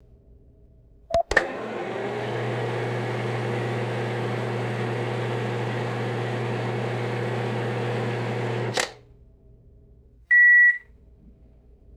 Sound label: microwave beeps runs and turns off; machines